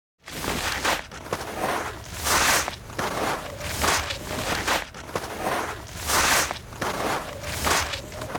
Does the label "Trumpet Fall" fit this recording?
No